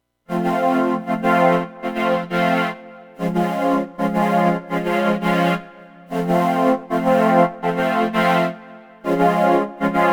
hello there strings, vocoder; analog synth

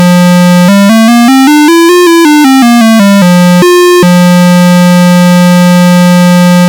keyboard voltage to vco no port; analog synth 101

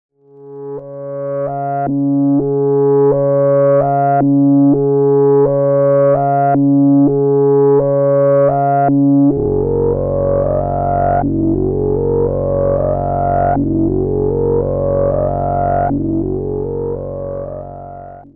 melodic cycle (dry); analog synth

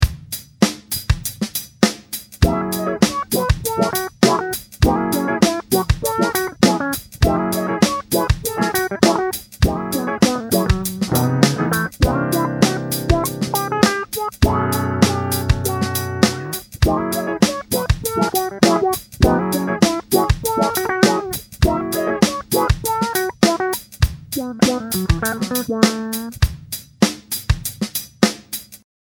Guitar, guitar trigger; analog synth; sound lab mini synth

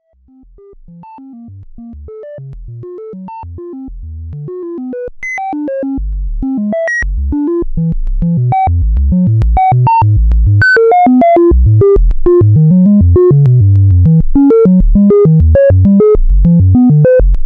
Sine Wave; analog synth; sample and hold; Paolo

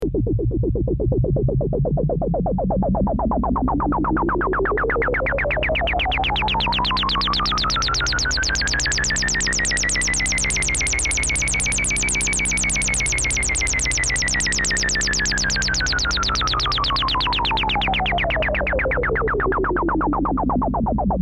full res adsr lfo; lp vcf; analog synth

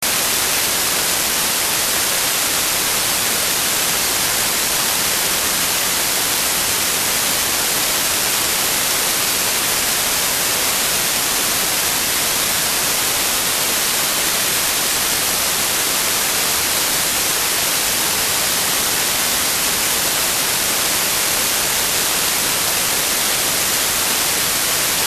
grainy noise mid setting; NOISECORN

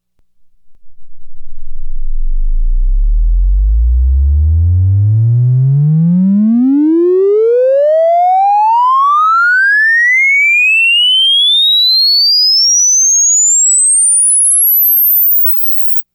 vco range example; analog synth

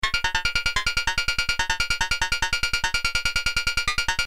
S and H; Sound w/Sample and Hold; analog synth; Alan McKerchar

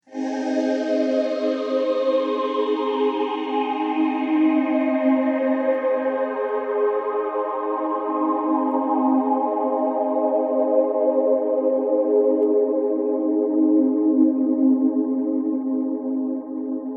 Korg N1 Choir, phase shifter

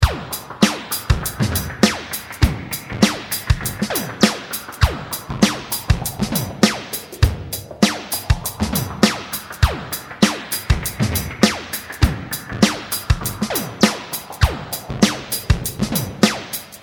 drumpad; analog synth; sound lab mini synth; drum trigger

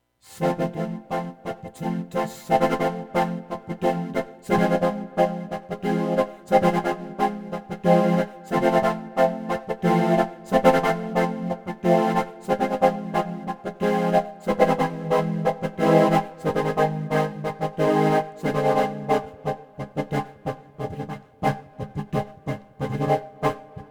skat, vocoder; analog synth

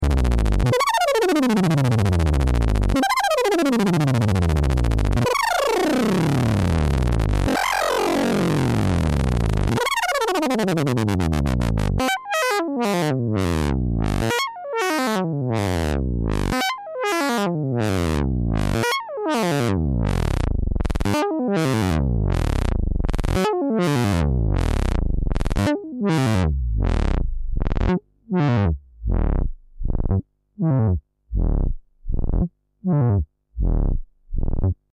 SandH vco vcf vca lfos; analog synth; David Wood

